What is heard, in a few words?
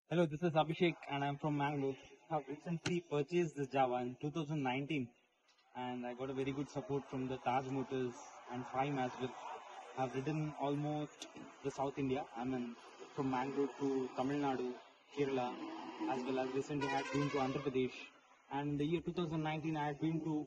vocals